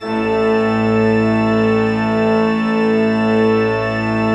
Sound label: A1, Cathedral Organ; ILIO; Propeller Islands Cathedral Organ